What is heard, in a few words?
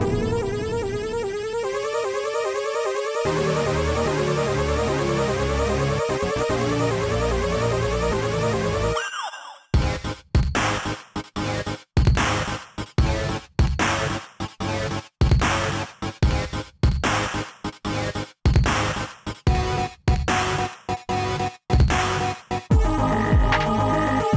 BATTLE BOSS LOOP 148bpm; Cinematic; Intruder Alert; SAGA Complete Collection v2.0; SAGA III - Cinematic Normal & Boss Battles